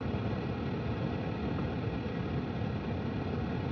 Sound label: Ambience Loop, outdoor